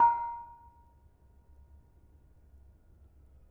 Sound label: Bonang Panerus, gamelan; gravityharps; timbrebox